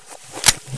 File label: browning to ironsights, weapons